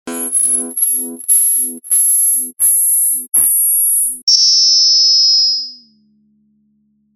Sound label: lyre